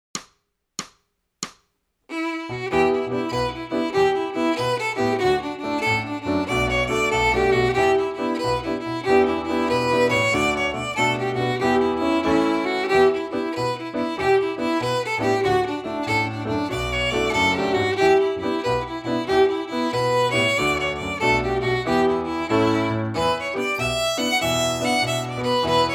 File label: Swallowtail Fast